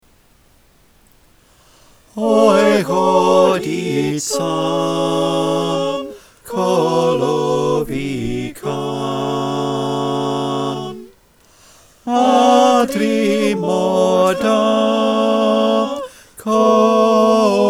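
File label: Bass, choir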